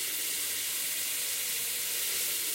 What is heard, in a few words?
Teapot Inside Water; Game rip; MiSide